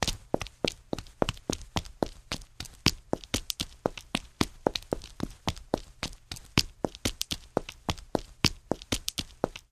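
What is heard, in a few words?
Footsteps Female Run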